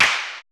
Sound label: rim, Roland MC-909